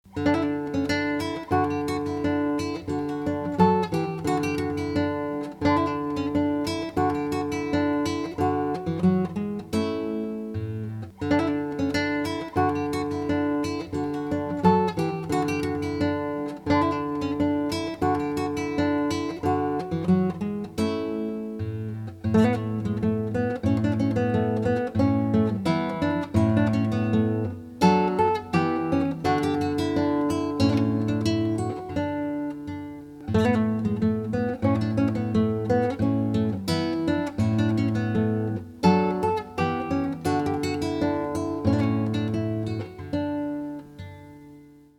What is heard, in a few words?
Classical Guitar, Baroque Spanish Dance; Gaspar Sanz